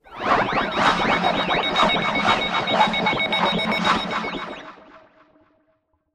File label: space shuffle